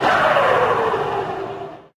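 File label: scania shifting sound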